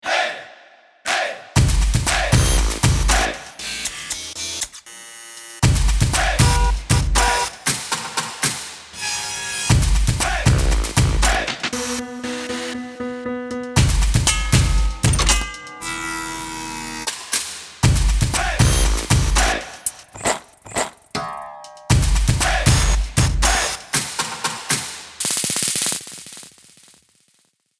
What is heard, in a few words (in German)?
handwerksmelodie hey hey klingelton